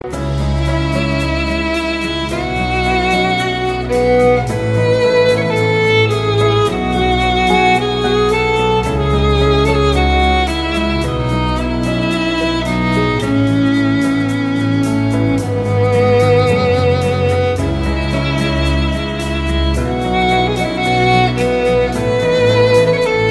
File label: VIOLIN RINGTONE; TITANIC